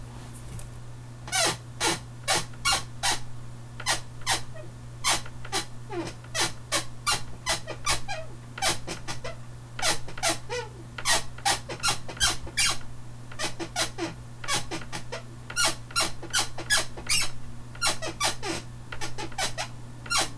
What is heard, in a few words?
squeak